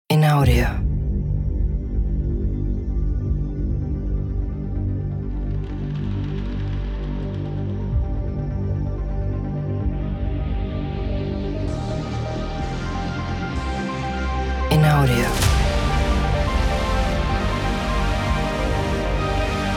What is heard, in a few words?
Mystical Space; MokkaMusic